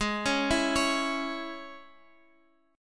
Ab Suspended 4th Sharp 5th, Guitar, Open F; Absus4#5; arpeggiated; chordshape

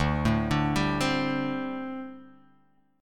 D 7th Suspended 4th, down

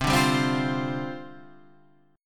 C Suspended 2nd Suspended 4th C; strummed; Csus2sus4; 8,5,5,5,6,8

